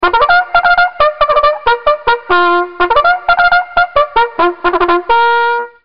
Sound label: First Call, bugle; BugleCalls